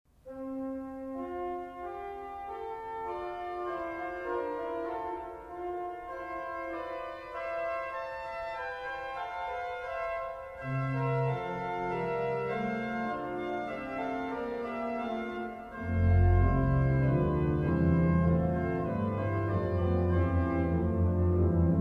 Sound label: Chorale in Fuga